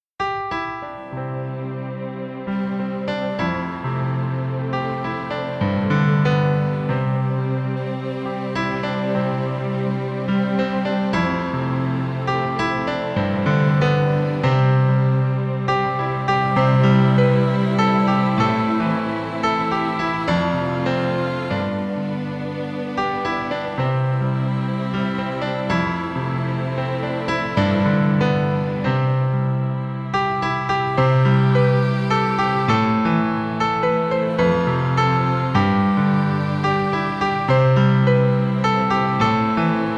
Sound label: praises instrumental